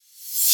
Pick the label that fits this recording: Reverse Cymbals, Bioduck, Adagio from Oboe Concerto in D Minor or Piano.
Reverse Cymbals